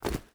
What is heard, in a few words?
ui drop leather; Rust